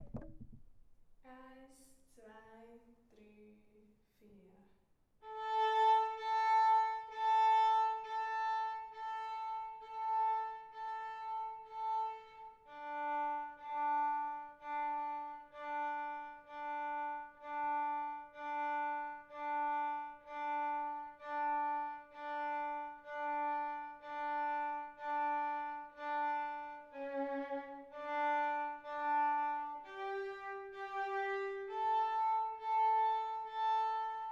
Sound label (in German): Violine; Kinderorchester; Schildkröte; Violine 3; Kinderorchester 1